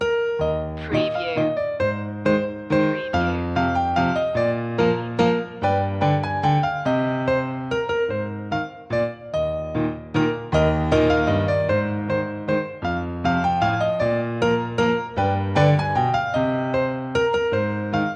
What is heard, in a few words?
we wish you a merry christmas piano loop; media music now